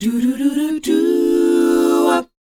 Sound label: DOWOP G; ILIO; Vocal Planet